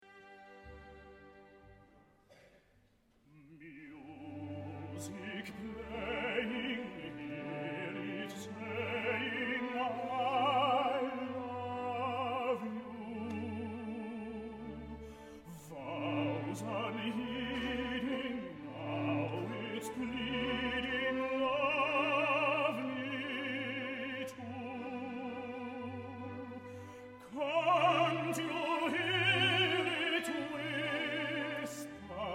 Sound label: The Merry Widow Waltz